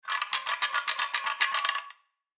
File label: Caulk Gun Pull Handle Multiple Fast; Machinery; Blastwave